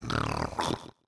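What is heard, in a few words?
goblin voice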